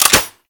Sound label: Goo Gun Mech; Warframe; Grineer